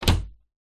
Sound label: furniture wardrobe door close wooden